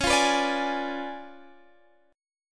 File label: C sharp 7th Flat 9th, chord; C#7b9; strummed